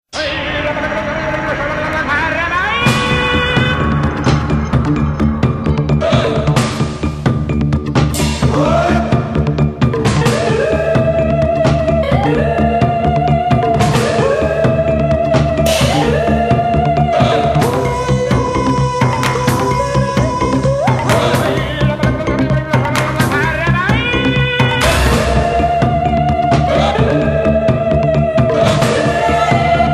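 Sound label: harp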